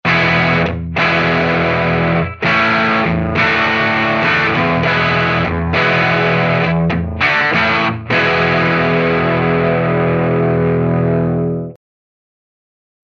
guitar; waves

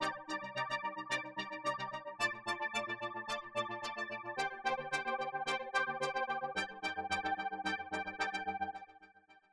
Loop With Flair

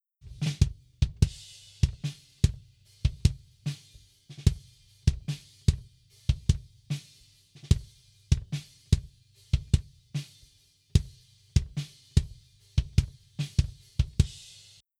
bassdrum

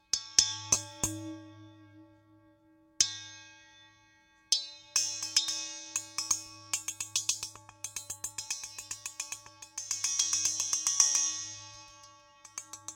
Large Sloshy Patterns FX Splash

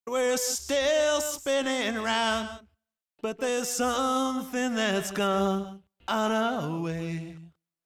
delay fx

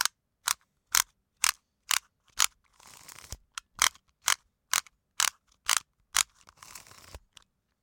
Tape Measure Rhythm 120 bpm

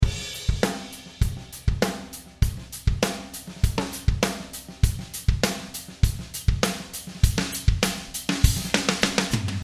Mixed Drums; waves

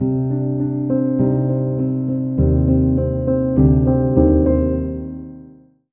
Pianissimo Piano Riff

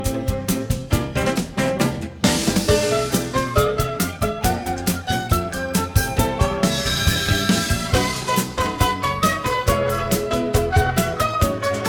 jazz, Chinese Style Jazz; suno

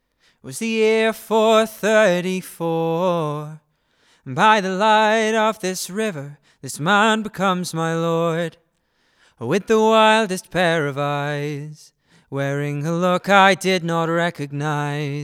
Vox ASP800 SM57; audient; Vox Normalised

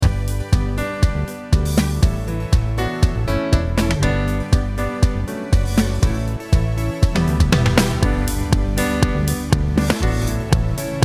drums with compression; waves

